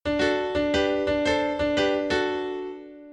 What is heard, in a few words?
piano